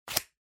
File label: Scissors Cut Paper